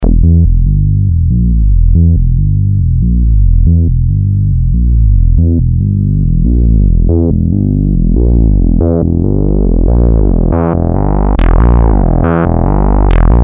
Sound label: minibass